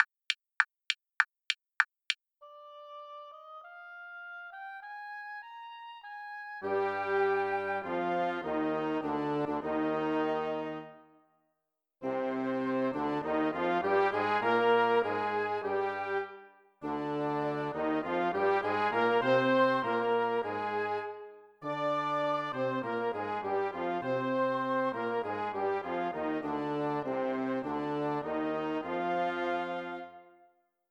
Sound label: oboe fast